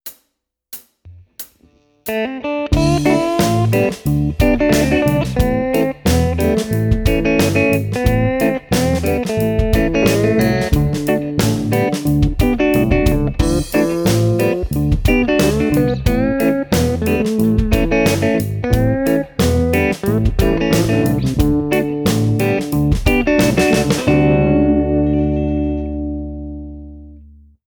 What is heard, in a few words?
Slide, Guitar; Guitar Techniques 2018 Issue 289 December